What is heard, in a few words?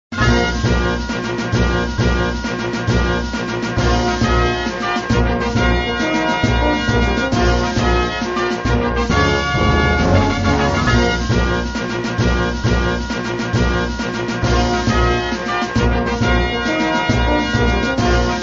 Music, flipper; sttng